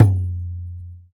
mid tom drum single hit f key, Royality free tom drum tuned to the F note; tom drum samples; one shots